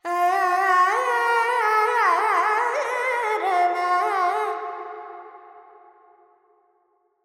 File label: Verb Dorian, Vocals, Carnatic Female, Alaps, 70 G; Sample Banks